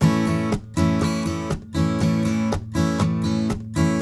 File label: RIFF, Blues Guitar; ILIO; Fretworks